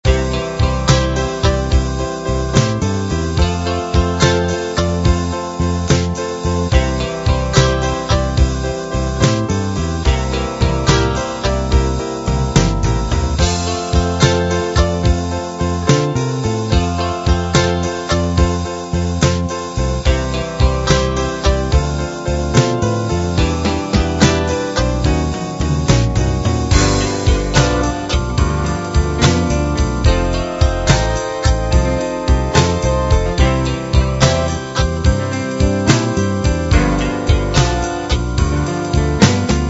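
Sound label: 68 blues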